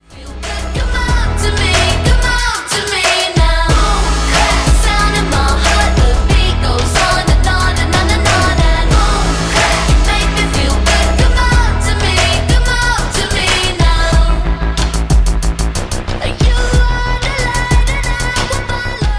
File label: boom clap